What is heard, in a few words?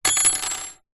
industrial thin metal hook screw drop on wood